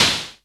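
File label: percussion; ZERO-G; Datafile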